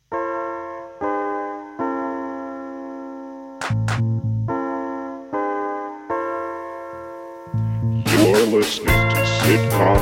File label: groove